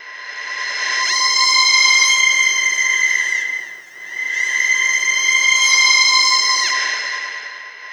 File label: CRYING OUT; Spectrasonic Distorted Reality 1